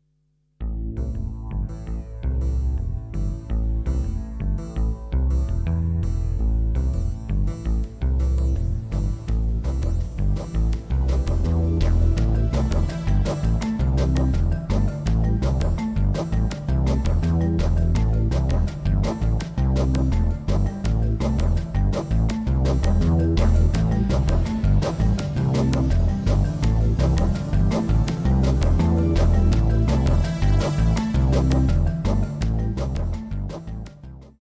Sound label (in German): Industriefilm